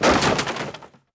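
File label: Wood Collapse Medium; objects; Star Wars Obi-Wan; XBOX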